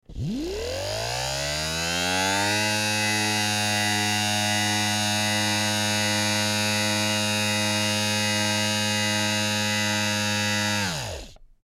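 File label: Mechanism Long Steady, servos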